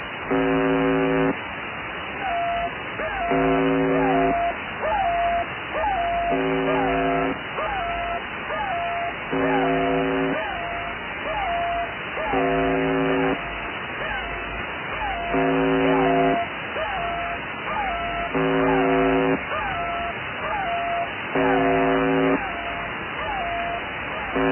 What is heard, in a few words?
with air horn